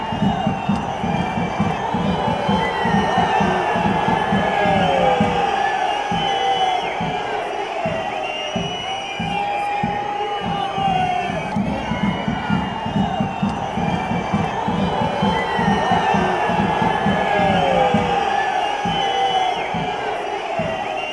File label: boo, chants